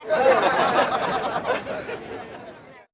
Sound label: crowd backlot laugh; Ambience